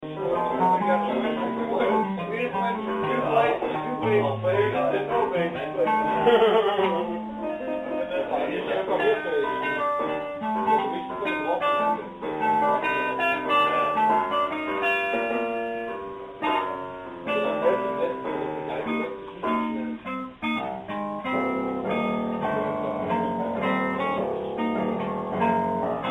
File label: Piano Guitar; Trumpet